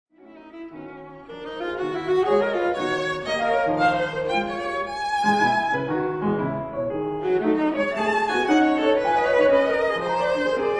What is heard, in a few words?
Sonata for viola & piano; Op. 244